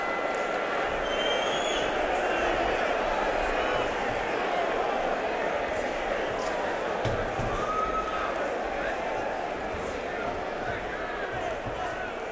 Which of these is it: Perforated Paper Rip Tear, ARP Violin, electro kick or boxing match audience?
boxing match audience